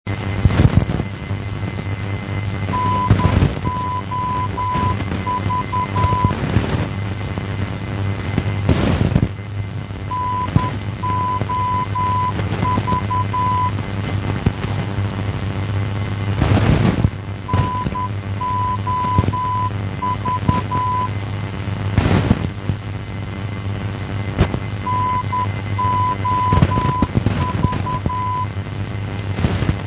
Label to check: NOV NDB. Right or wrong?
Right